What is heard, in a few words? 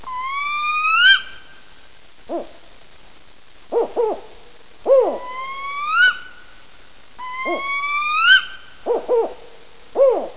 Spotted Owl calls